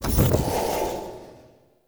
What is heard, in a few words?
Soul Ability Rage Energy Empty; Powers; Soul Steal